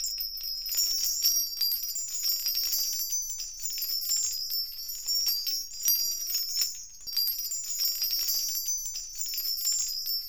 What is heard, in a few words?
BELLTREE